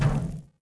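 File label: fire pot extinguish; Star Wars Obi Wan; XBOX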